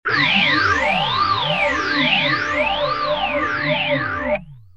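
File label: guitar phaze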